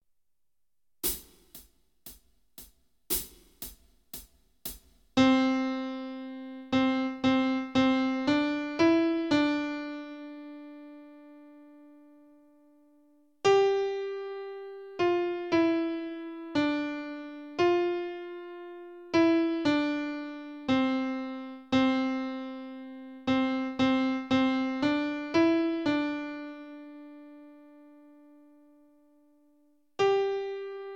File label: Melody only; Kenneth Baker - The complete keyboard player